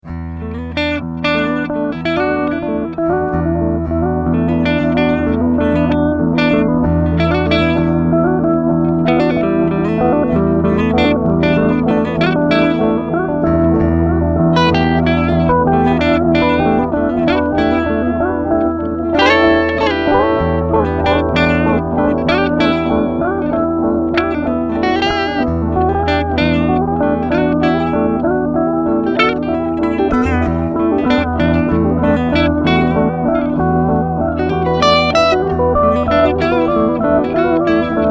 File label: Large Delay